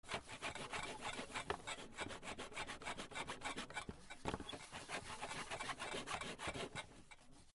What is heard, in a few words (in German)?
kleine saege